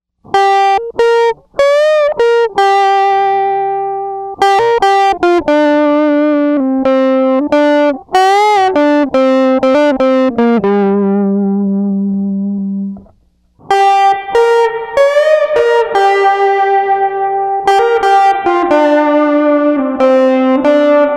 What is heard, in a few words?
silicon driver overdrive guitar reverb; dr zee; distortion; spring reverb